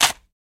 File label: Bone Crab Shell Break